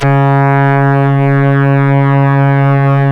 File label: C#, strings; Big Fish Audio